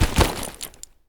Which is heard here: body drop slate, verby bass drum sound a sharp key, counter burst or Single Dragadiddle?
body drop slate